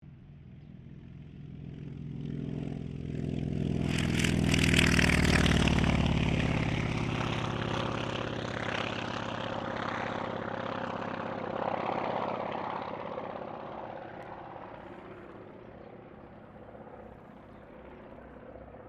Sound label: AIRCRAFT CAC WIRRAWAY TAKEOFF